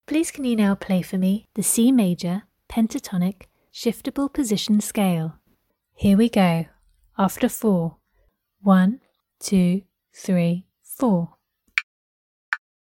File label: c maj pent shift